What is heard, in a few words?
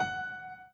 Piano Chord